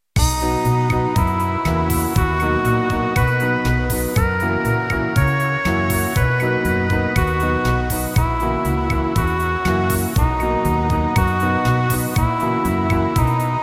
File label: pop